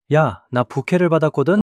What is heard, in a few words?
surprise